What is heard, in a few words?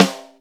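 R-8 high tune snare; SN-R8-05 Jazz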